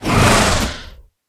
engine

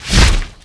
walk

